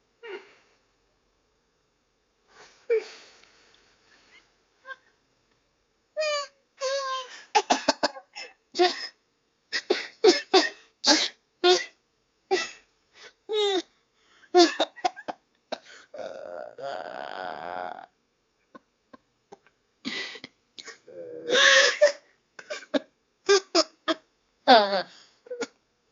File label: the man walks then starts; human behavior